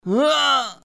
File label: noodle groan